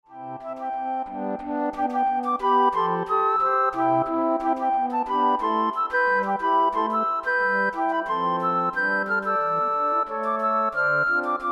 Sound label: Flute Ensemble